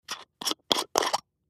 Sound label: Unscrew Wide Top Jar, kitchens